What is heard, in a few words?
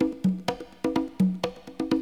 CONGA 126, PERCUS LOOPS